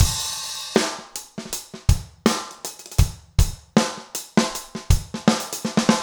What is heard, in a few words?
trip hop